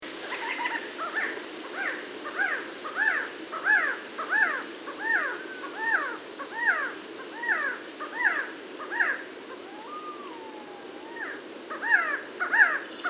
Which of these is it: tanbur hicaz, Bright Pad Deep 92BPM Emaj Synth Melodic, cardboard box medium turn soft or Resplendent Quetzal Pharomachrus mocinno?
Resplendent Quetzal Pharomachrus mocinno